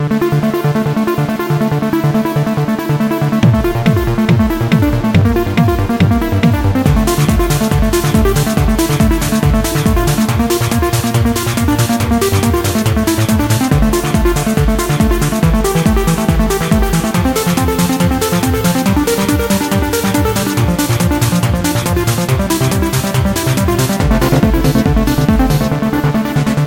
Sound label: Trance